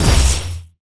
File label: basson